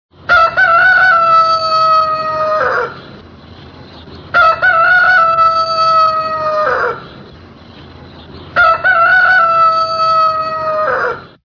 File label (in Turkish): Horoz alarm